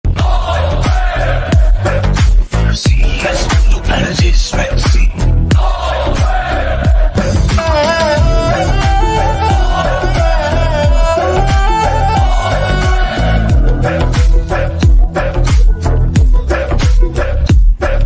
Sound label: trailer bgm ringtones song ringtone; devara